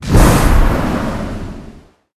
Vehicles Hoverboard; unreal development kit

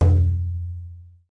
Conga; lego my style preschool

